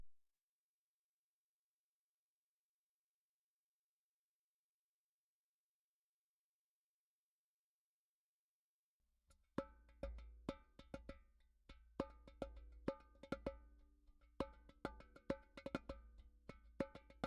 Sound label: DERBUKA